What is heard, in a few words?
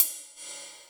Open Hhat, Hihat; Equipped Music; Loungin House